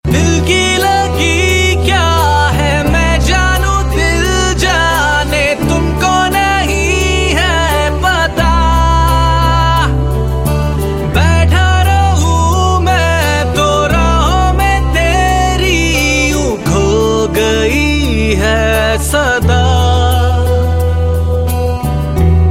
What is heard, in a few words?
Unplugged